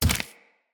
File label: Root Spider Mother Step Dirt